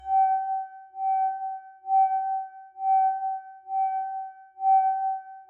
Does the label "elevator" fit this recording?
Yes